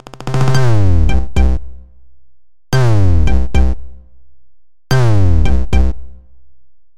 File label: concerto; Xperia